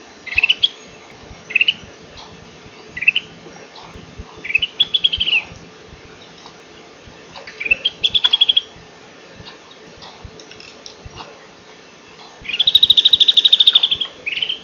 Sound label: bare faced bulbul